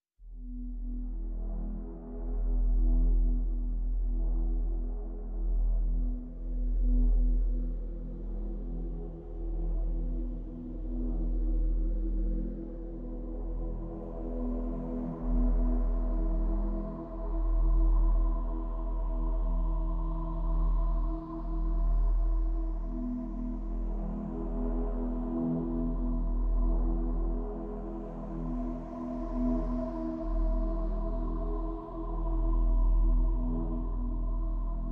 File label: Pads Only